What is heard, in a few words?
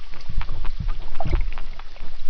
searobin, fish grunting or feeding